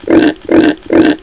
boar idle; Giant Boar

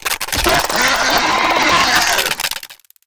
Spider Trap Activate; Evolve; Gorgon

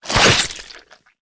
Web Shot; Lurker; Evolve; Gorgon

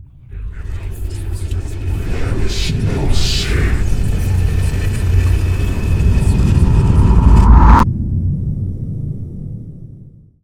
levitation; Necromancy

